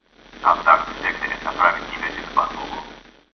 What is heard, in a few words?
radio; Dystopia; broadcast